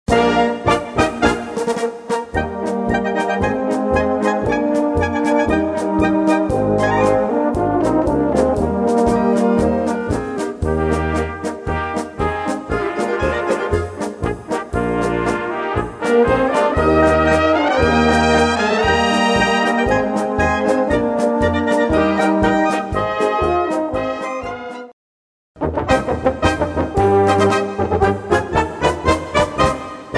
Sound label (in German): Polka